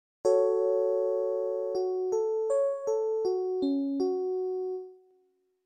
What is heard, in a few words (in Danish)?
skala fis